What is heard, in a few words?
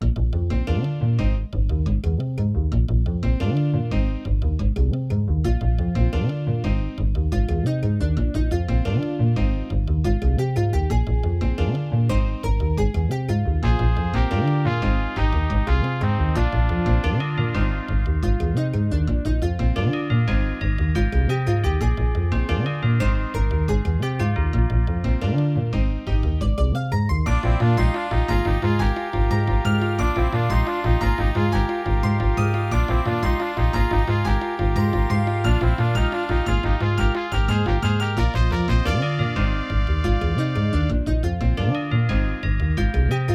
diamond db 4mb; Soundcard test page; Hocus Pocus